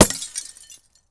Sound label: Fluorescent Light, Break